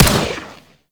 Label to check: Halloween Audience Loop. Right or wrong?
Wrong